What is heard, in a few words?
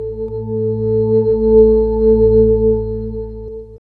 SWIRL